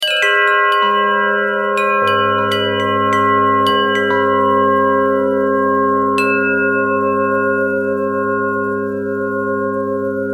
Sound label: bell harmonic twist, bells